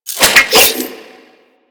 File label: Whip Spin; Grineer